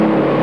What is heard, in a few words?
motor, boat